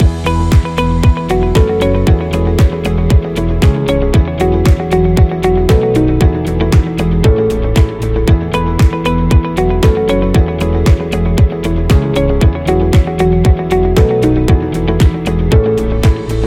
Promo Loop Business; corporation

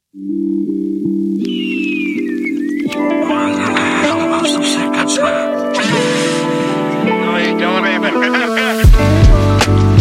Dream Vibes, Lofi Chill Hop

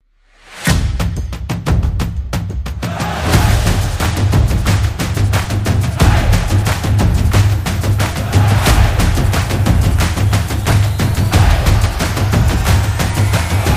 Action Drums

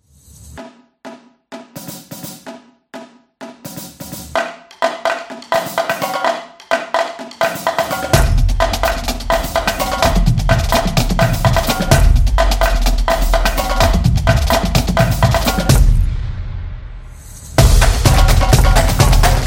Latin Dance; sport drums

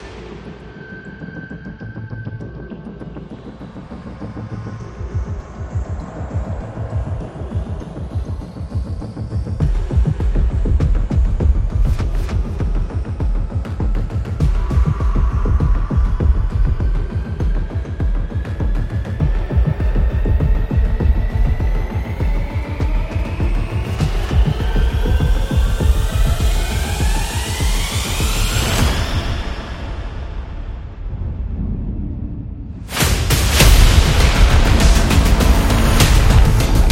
War Trailer; war action

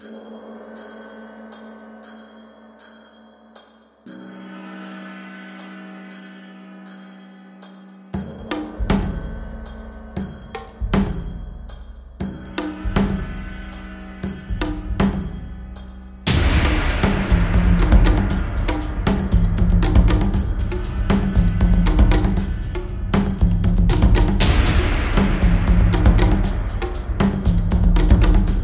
Japanese percussion taiko

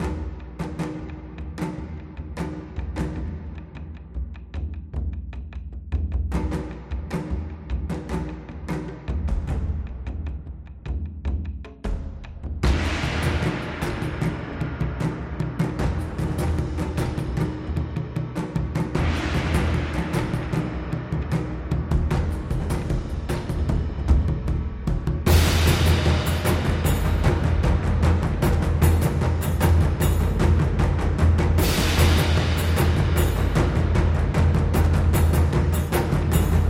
Taiko Drums; travel

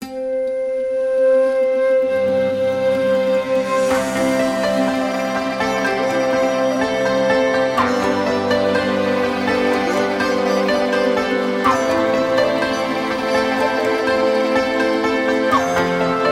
Chinese Background

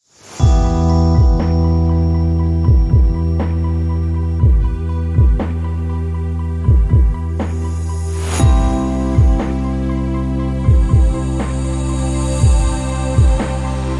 deep documentary ambient; atmosphere music

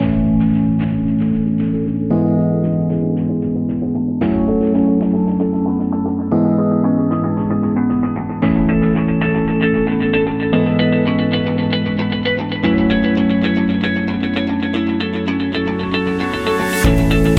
Medicine Corporate; medical background

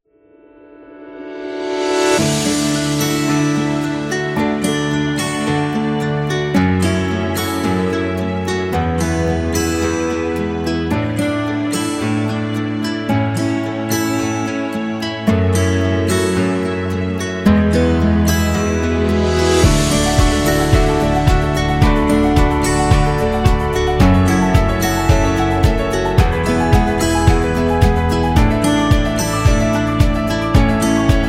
Wedding Slideshow

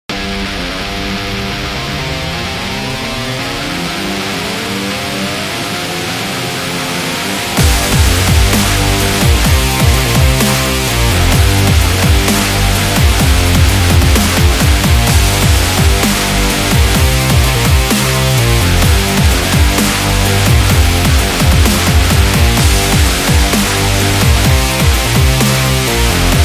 The Rock Dubstep; sports training energy

